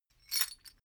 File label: metal chain movement single